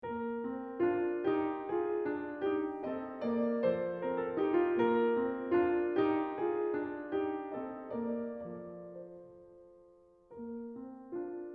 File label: Partita no. 1 in B flat major, Menuet II; BWV 825